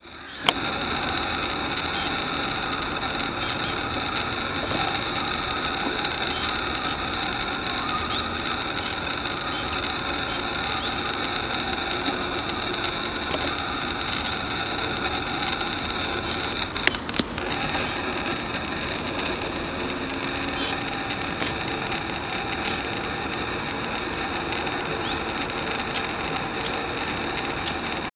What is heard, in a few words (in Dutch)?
Bedelroep Koekoeksjong; vogelgeluiden